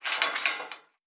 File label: Metal Door Handle; Survival Game Sounds - Lite Edition; Doors